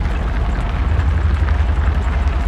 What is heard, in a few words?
shoulder dirt road loop; Blind Drive